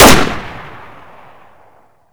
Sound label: ak74 shoot; weapons; abakan n; Boomsticks and Sharpsticks